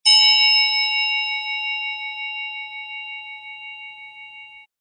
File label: bells bell brass single medium ding